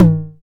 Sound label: TOM, Dance